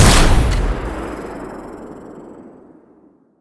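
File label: shot from warship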